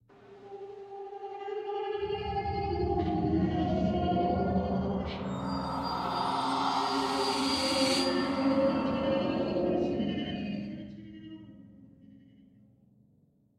Forest Crow Scare Body

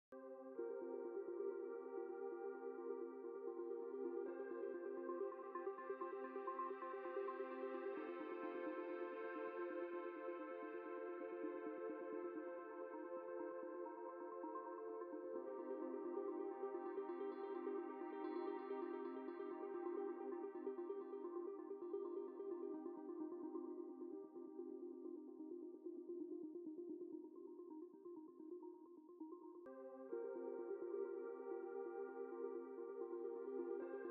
Music UI Loading; lego horizon adventures; Biome=Cauldron

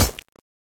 Impact Flesh

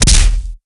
Enemy Take Hit; Skylanders Cloud Patrol; Arcade, iOS, Mobile

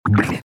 Gurgle; Boss Lite

